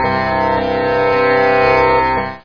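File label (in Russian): ситар эффект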